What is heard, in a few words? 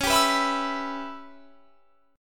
C sharp Minor 7th Flat 5th C sharp; C#m7b5; strummed; 21,22,21,21,24,24